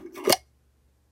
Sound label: jar open